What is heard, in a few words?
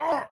monster dead; Project-AI-Chronicle